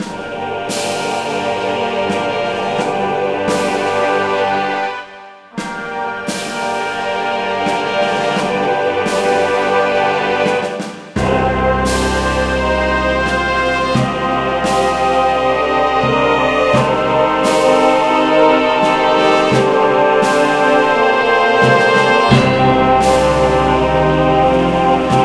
Music Intro; DCS

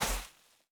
Personnel Footsteps Sand; DCS

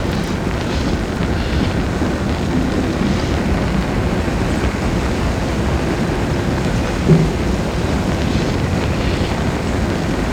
fire vehicle small loop; Call of Duty Modern Warfare

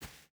footstep barefoot sand run; Rust

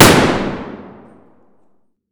rem700 indoor close; Escape from Tarkov

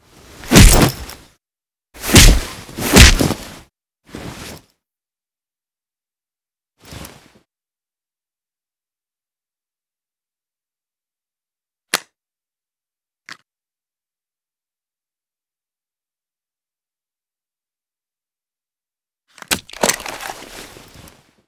assault interogation beating; Modern Warfare

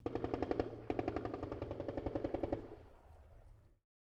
ambient shot mono; War Thunder

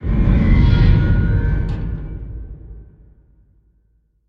damage hits explosions; World Of Warships

